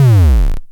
KLONE BASS, Klone Dual Percussion Synthesiser, DRUMHITS MONO